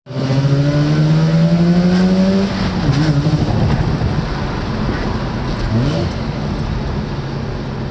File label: choppy exhaust sound exhaust occilation sound; rx-8